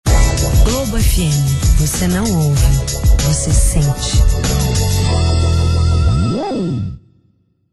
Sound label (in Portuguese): Globo FM RJ Vinheta